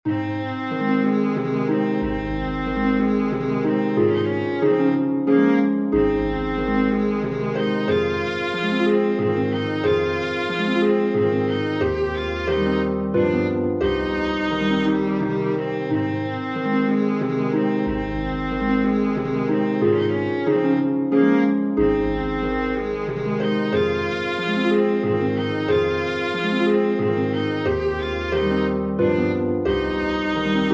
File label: waltz major for viola harp; brahms johannes